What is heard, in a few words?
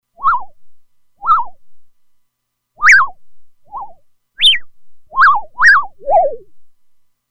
Wren, Roland Alpha Juno-2; Factory 2